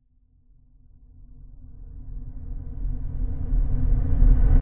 riser low frequency subtle airy light tension drama; sound design